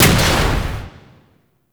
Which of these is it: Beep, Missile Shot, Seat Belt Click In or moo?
Missile Shot